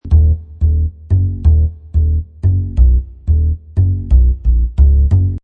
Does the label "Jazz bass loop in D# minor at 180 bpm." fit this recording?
Yes